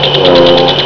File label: bullfrog call; texas nature trackers; amphibian watch